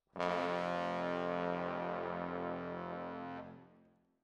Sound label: Trombones, jazz for piano